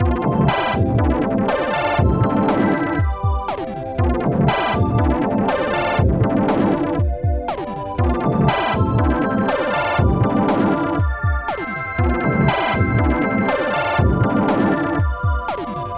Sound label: Happy Town B - Electro